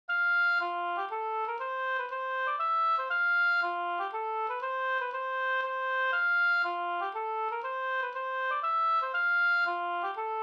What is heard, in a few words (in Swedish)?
slangpolska